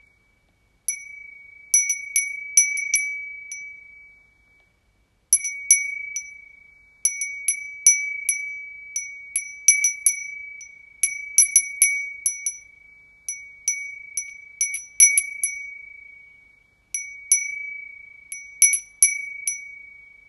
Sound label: windbell